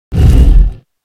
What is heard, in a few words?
arena stone click